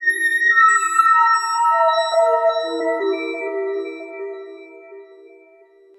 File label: Lead Spook, Drum & Bass Keys; Sonic Foundry (Sony Creative Software); Knutrix Drum & Bass